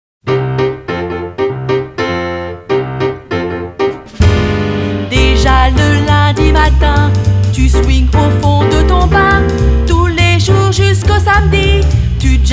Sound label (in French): Jazz Week avec paroles